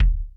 kick, Millenium MPS-400

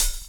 DR. LOOP; X-Static Goldmine 3

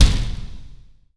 SHOWER VERB, percussive

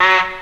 tuners

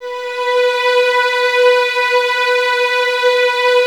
B 4, String Ensembles Violins; Miroslav Vitous; 11 Violins

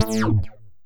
Synth Hit A

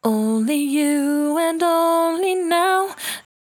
68bpm D, Velvet Vocal; Zero-G

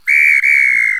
BIRD, bird call; Greytsounds VOL-1; BIRD CALL FX

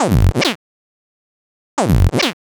Elastic Rhythm, EDM; Sonic Foundry (Sony Creative Software); Afterhours EDM; Session 10 - Come In Hard

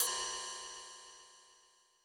Roland U220 Dry Set; drum machine; 90 s Sample CDs; 300 Drum Machines

